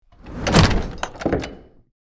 Wooden chest box treasure closing

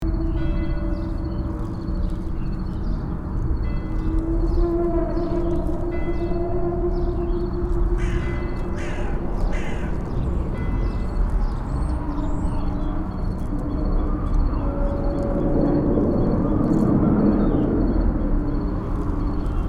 Foggy graveyard horror ambience tension loop

